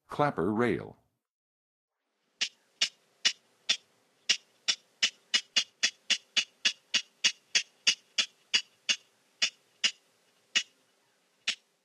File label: Clapper Rail, Birds of North America; Western USA; Western USA Songbirds